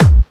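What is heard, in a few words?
bass drum Punchy, kicks; VEC1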